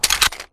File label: Sniper rifle reload